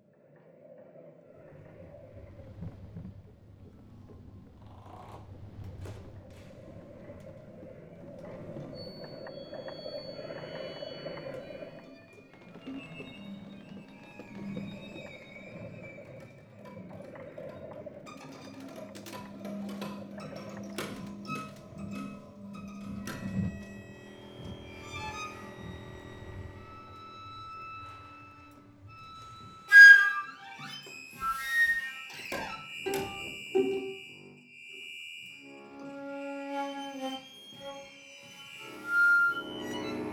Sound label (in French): extrait forêt; Cairn